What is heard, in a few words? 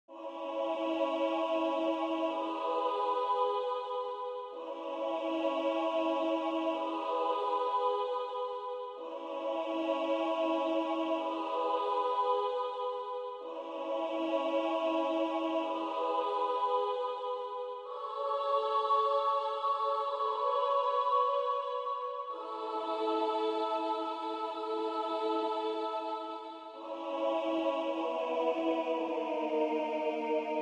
alto, choir